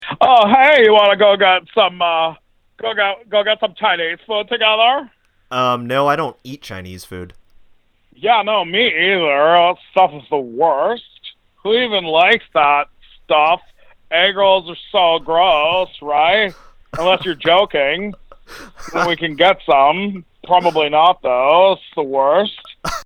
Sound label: Vocal